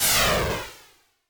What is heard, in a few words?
Start